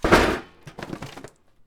Book Drop Metal; SFX Kit; Foley - Misc

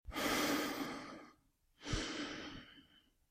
Breathe Slow heavy breath; Eden; RadwinIsland

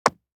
DICE on Neoprene, Drop, Standard, 5 Five Dice; Samples; ArticulatedSounds; DICE Sfx Party (Extended)

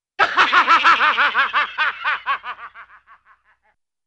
Evil Fiendish Villainous Laugh, Cartoon; Hollywood Edge; Cartoon Trax

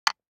DICE on Wooden Tray, Drop, Standard, 1 One Die; Samples; ArticulatedSounds; DICE Sfx Party (Extended)

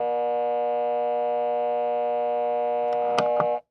Alarm Clock Buzz; Clocks, Watches and Timers; Blastwave

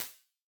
CORRIDOR FLUTTER ECHO, impulse responses

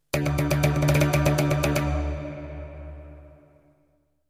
jingles; 90 DDD Jingles

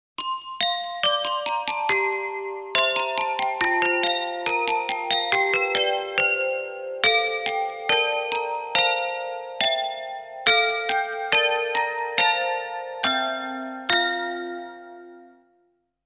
Christmas Jingles; Koka Media Productions; More Christmas Jingles

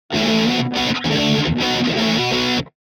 Guitar Rock Finale Rhythm; Hollywood Edge; sound designer's toolkit v2

